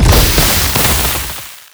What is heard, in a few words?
Explosion; MG Renewal; HavocSpear